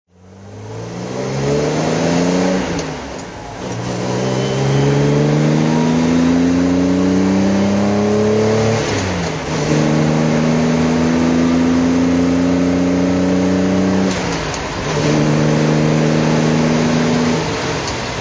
aston db4 drive, Aston Martin DB4 serie 5; Motorgeluid rijdend; Aston Martin DB 4 serie 5, 1963; V3D/ ClassicarImages

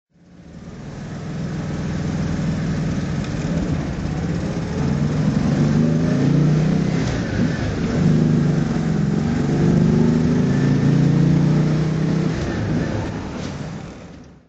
porsche 356 speedster 1500s drive

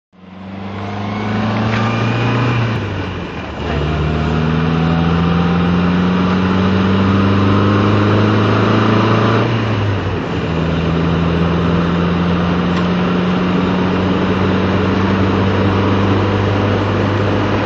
mg mga 1500 drive

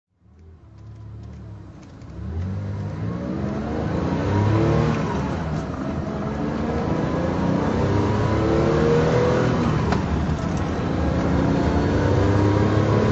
911 24 drive, Porsche 911 S 2.4 Targa; 1973

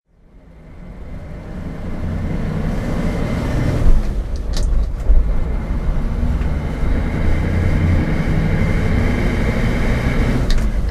ford V8 pickup driving

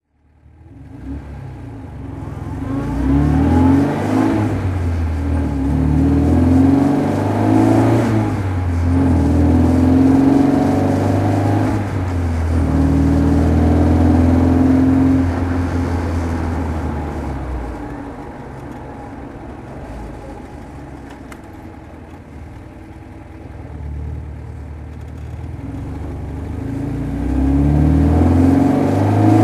healey 1004 creme brown driving

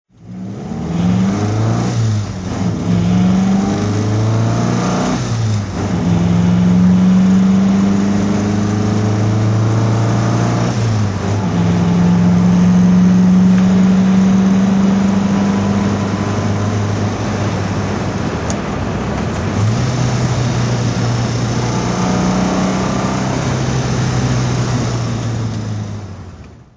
triumph tr4 drive, Engine sound driving; Triumph TR 4, 1965; V3D/ ClassicarImages

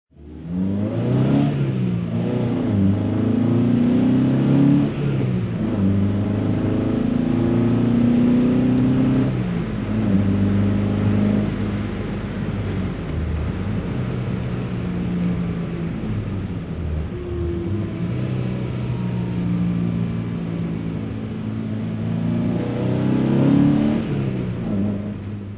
bmw 2002 ti tuned drive

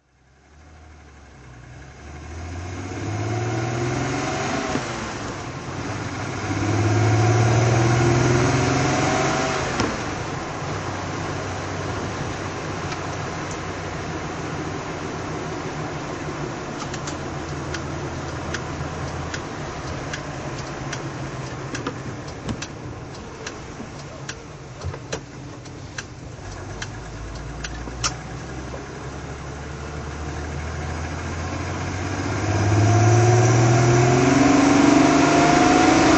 flaminia gtl drive, Lancia Flaminia GTL 3C, 1965

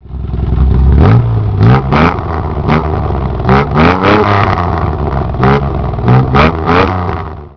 etype; etype green

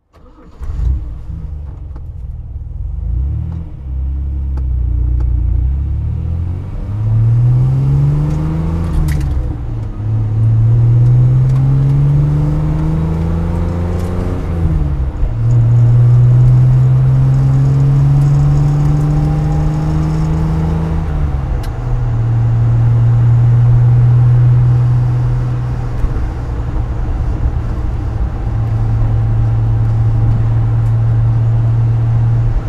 MG MGC GT rally driving

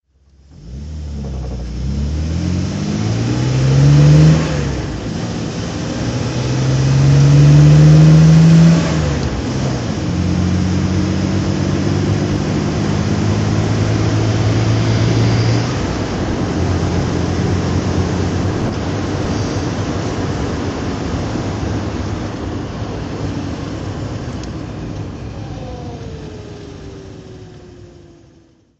mg mgc drive

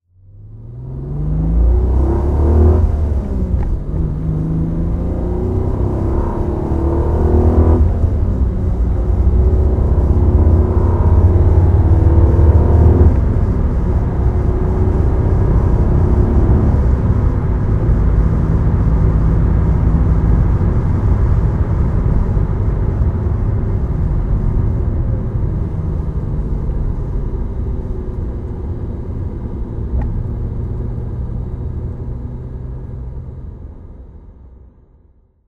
alfa romeo SZ drive

